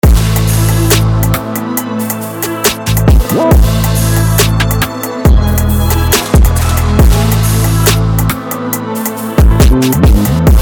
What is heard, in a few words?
Drill Drum Kit; Drillers 2022